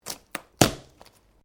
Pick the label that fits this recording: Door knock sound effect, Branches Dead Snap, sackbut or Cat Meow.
Branches Dead Snap